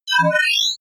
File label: confirm; soundpool